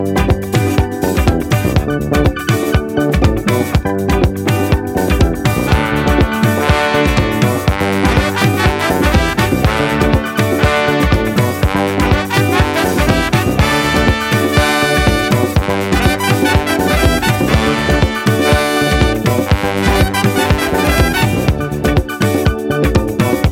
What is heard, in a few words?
Funky Disco Music